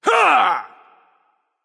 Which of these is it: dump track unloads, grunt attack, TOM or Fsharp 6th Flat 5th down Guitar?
grunt attack